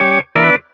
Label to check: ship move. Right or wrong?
Wrong